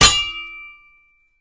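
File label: Sword Clanks Single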